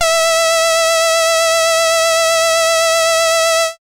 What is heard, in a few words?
SID lead E; ChipShop Deluxe; ChipShop Hits